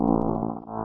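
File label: synthesizer single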